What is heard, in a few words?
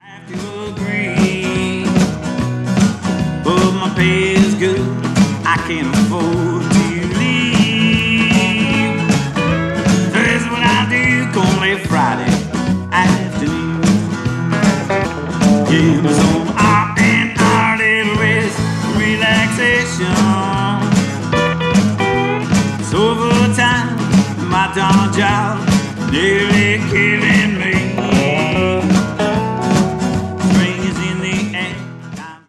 rest and relaxation